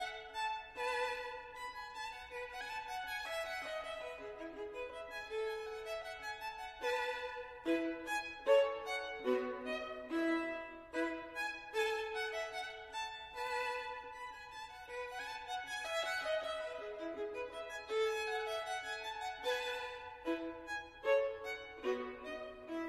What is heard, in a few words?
Gavotte en rondeau, Violin